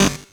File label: Bent Snare